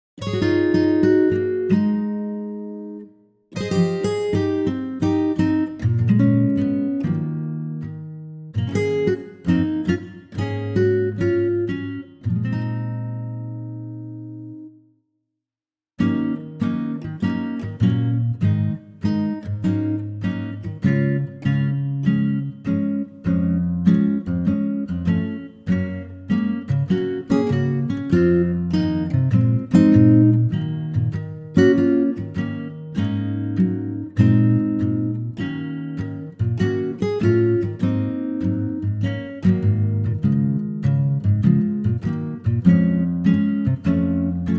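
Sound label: Db Acoustic Guitar; key - Db - vocal range - Ab to Db; lightly swinging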